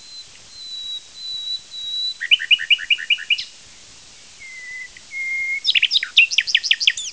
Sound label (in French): rossignol